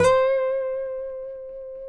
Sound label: FINGER, Guitars; TAKA